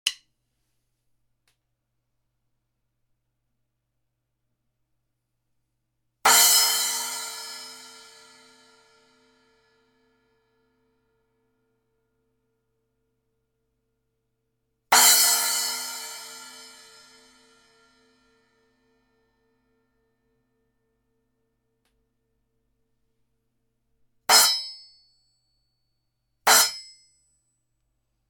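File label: Sound Formula Reflector 10 Splash, Cymbal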